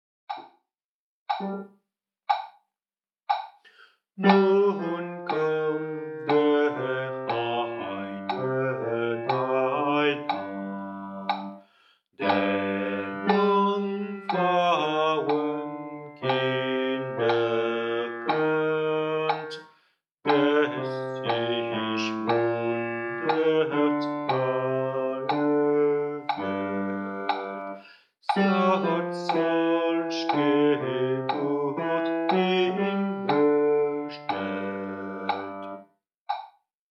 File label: nun komm der heiden heiland basse